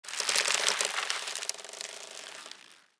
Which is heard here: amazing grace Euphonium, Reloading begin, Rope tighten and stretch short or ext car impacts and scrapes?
Rope tighten and stretch short